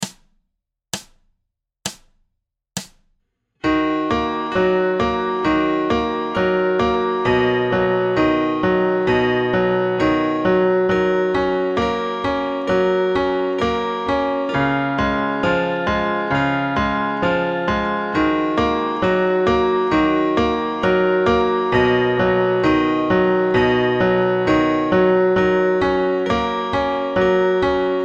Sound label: minus guitar slow